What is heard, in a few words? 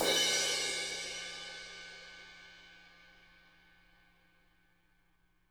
CRSH, CRASHES